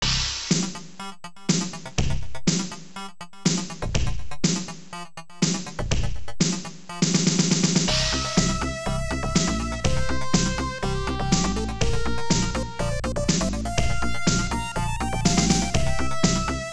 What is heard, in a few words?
Round BGM; Pitapat; X68000